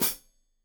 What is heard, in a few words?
Hat Foot, Hi-Hat, Cymbals Stereo